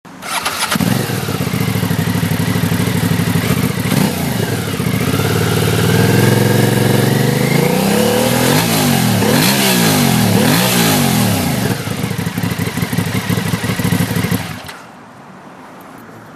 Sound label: Shiver